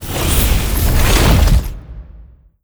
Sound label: move approach present crowbar, dog; npc; scripted